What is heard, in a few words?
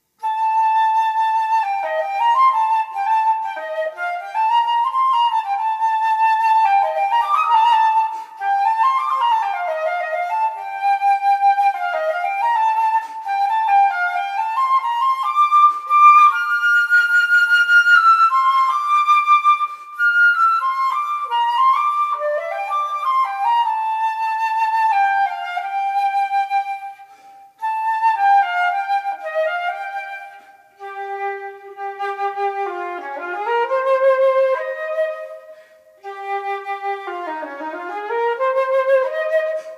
flute